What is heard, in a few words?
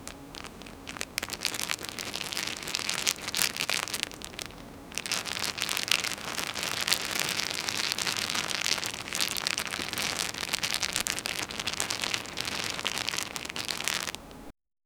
wrapping sounds cheerful, chocolate